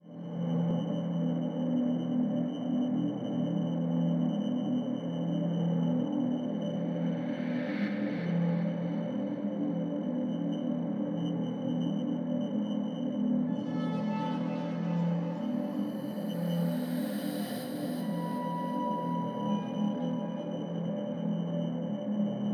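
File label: Ambience horror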